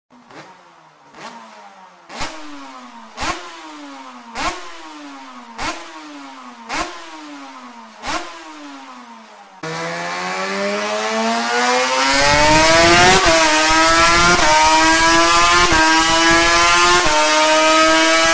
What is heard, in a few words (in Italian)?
il sound della nuova 4 cilindri 2T; Suter MMX500; svizzera